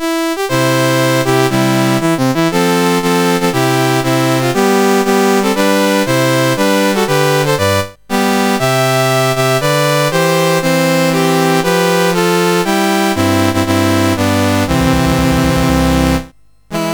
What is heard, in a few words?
Sarawak, National Anthems; CSG8580R5; Dave Marquis